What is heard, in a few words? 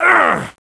pain